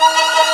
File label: RUN, FAIRLIGHT CMI IIX STRINGS